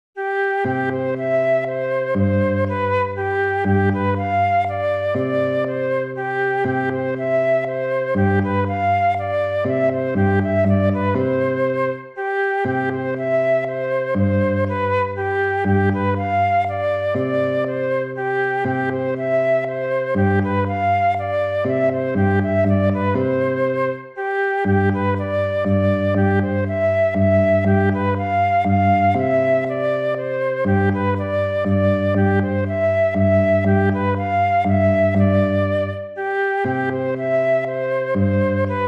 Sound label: waltz; Carulli Ferdinando